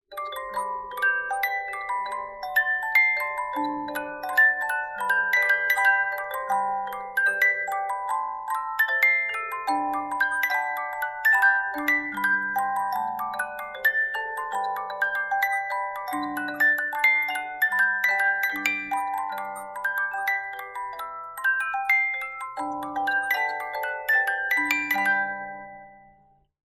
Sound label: Magic Flute, music box